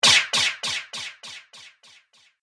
Multimedia Swipe Echo, transition

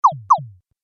Jump Video Game

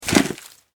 Foley Sand Pail Scoop Small Shovel; Playgrounds (Sound Effects:Backgrounds)

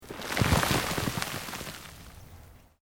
Earth Loose Move Short

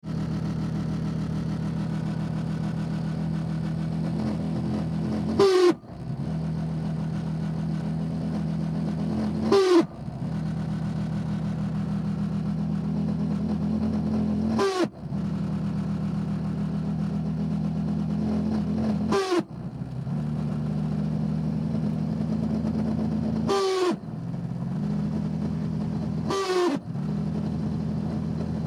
heater Fan defective, Car Heater Fan, Defective; Broken Car Heater Fan , Intermittent Squeaks And Rattles; Fans; Electronics

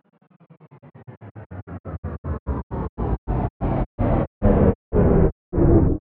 Chopper Crescendo Descend

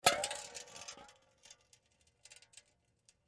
Beer Can Knock Over Roll Full Closed; Drops; Foley; Drop Sound Effects; Objects

